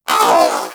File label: Companion Vocal Pain; Pets; Warframe; Zanuka